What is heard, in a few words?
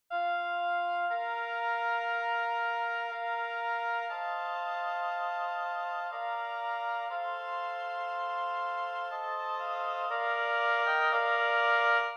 oboe quartet; Mozart; calm of the evening